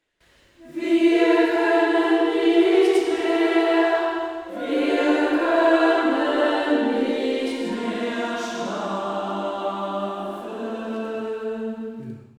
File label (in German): Auftakt Takt 35 ff A 2 im Tutti